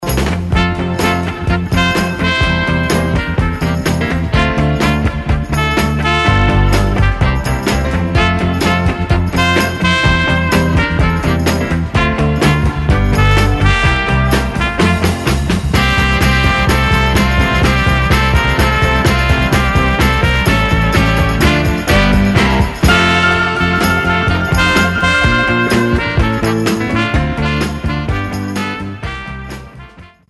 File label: Ode to Joy rock; Beethoven